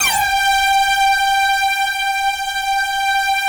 SQUEAK G4, Yamaha VP-1; Music Store

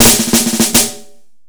Snare Fill; Sonic Foundry (Sony Creative Software); Futurist Drum & Bass

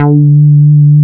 JNO BOTM D4, BOTTOM BS; Drums In Your FACE!

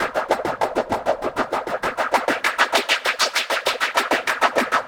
Echo Chuff Splat, Synthetic Pulses

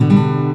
STL STRNG CRD; Best Service; World Colours

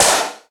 SD, Yamaha RY-10, Drums

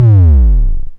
BASS, Dual Percussion Synthesiser; Klone

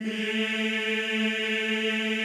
EEH A2, Extended Classical Choir; Best Service

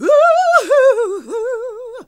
GOSP. Singin; Sound & Vision - Gigapack II CD 1 (Roland); HAL Gosp.Singin`

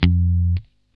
GUI F2, E-GUI MUTED; Sound & Vision; Gigapack I CD 2

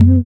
CONGO, African Perc; Spectrasonics; Supreme Beats; African Contemporary; SB Afro DRMs

